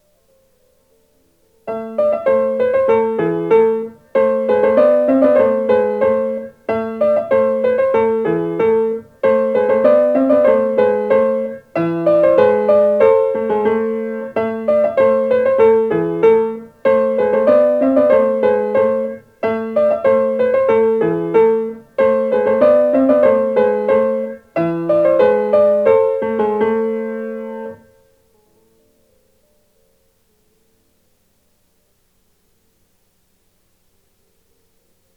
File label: Turkish Dance